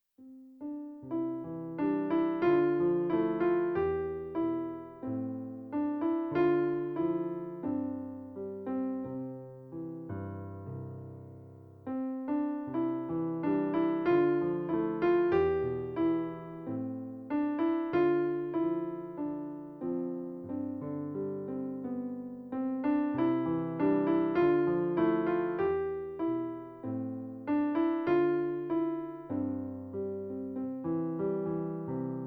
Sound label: Backing